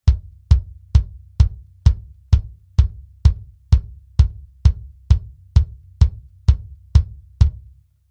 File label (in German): BD Mic Test MD441 solo dry